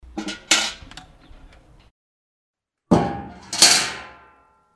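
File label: vedro postavili, bucket put down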